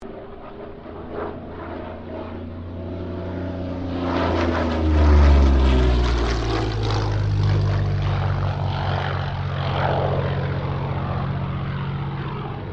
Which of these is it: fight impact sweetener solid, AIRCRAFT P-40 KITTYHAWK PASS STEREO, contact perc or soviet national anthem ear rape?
AIRCRAFT P-40 KITTYHAWK PASS STEREO